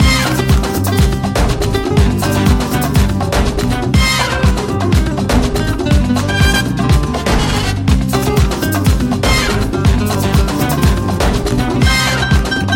emote conga line music loop; actmod; fortnite

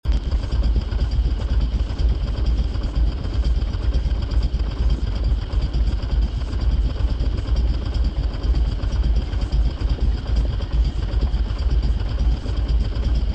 idle, vehicles cars; lwcars

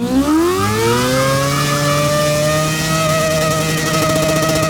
rev, vehicles cars; lwcars

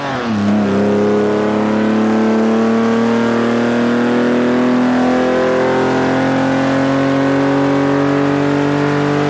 third, vehicles cars; lwcars

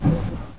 depressurize; zrush

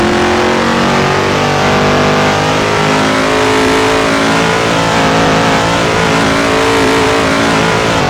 third, vehicles cars; lwcars

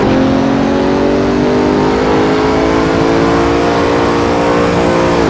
third, vehicles cars; lwcars

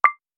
click; actmod

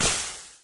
cooldown; zrush

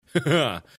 laugh; assassinm4a1

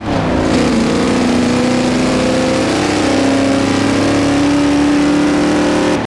third, vehicles cars; lwcars

